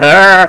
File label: LAFF; persone